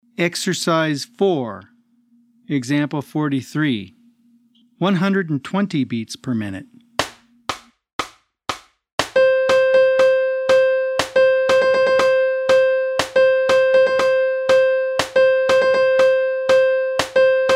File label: 120 BPM